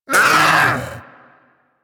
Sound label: Vocal Pain C; Warframe; Hunting Critters; Vulpaphyla